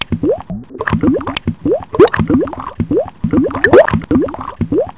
bubbles; NightFall DEMO

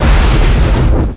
BLITZ

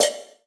DRUM SHOT HOUSE 125; eJay; Singleshots

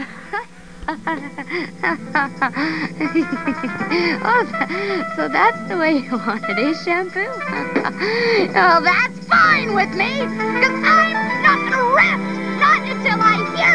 battle cry; ranma

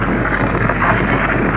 WALL ROT; CHASM

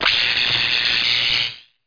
shock

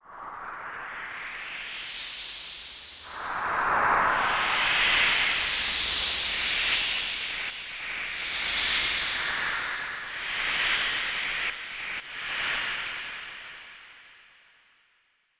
snake; croc

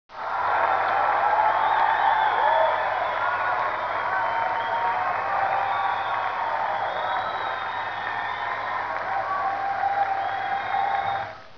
muffled crowd; How Many Bugs in a Box

